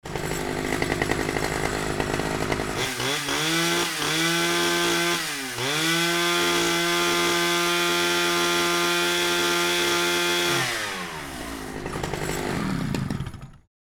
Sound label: Chainsaw revving and cutting branches in a tree